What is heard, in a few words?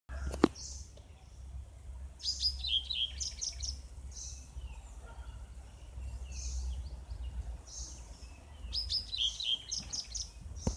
White browed Wagtail Motacilla maderaspatensis